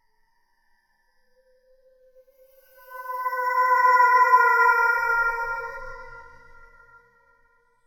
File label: Horror Ghost